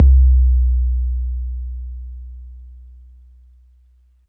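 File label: bass drum 808 MPC60 Click B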